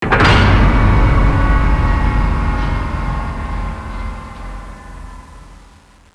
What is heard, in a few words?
power down